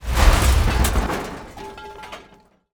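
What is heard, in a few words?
impact debris fall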